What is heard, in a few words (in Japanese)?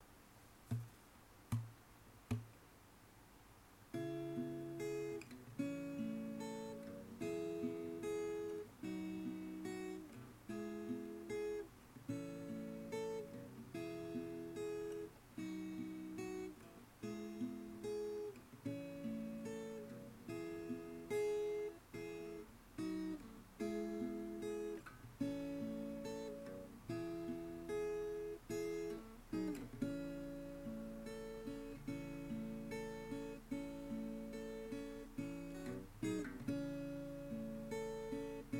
アコギ伴奏